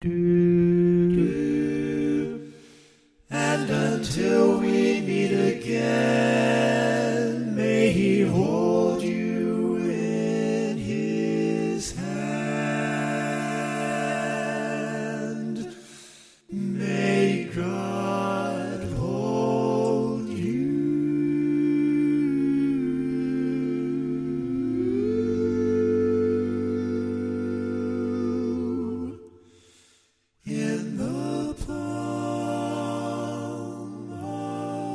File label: Bari, barbershop